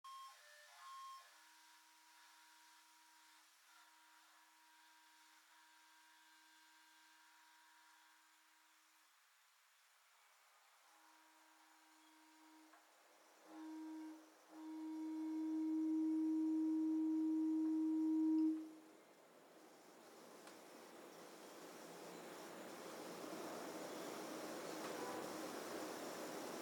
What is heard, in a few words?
reflexive Scanning listening (for birds)